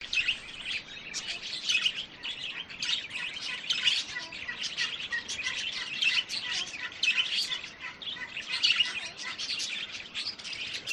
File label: urban farm budgerigars and background birds